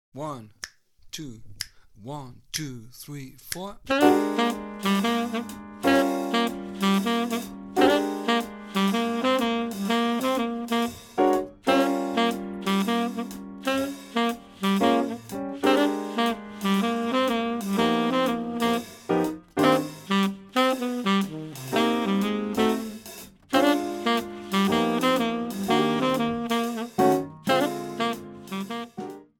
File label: tenor in 2 play along; madness